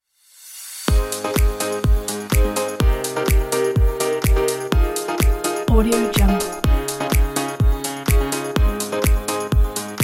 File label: happy summer upbeat vibe